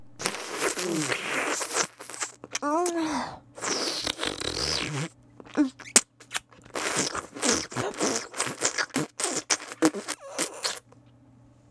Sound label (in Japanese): 吸うフェラ音; 特典エロ台詞